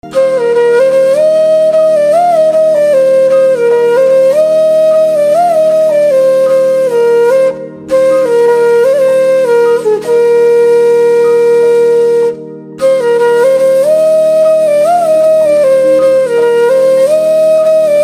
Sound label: Flute Instrumental Ringtone